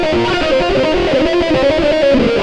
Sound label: xtra lead